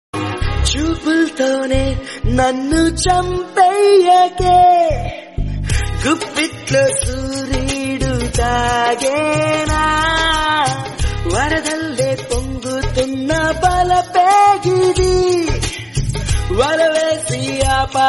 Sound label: telugu song